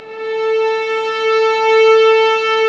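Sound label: violins; String Master Series; Vlns 6 p-mf; Vls6 p amb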